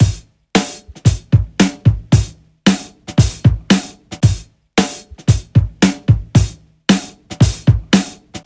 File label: 114 bpm drum loop sample g key